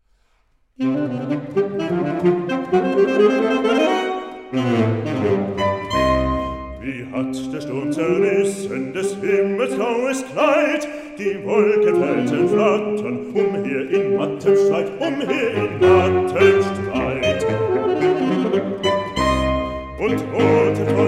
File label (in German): Sax Winterreise Sturm; Allemande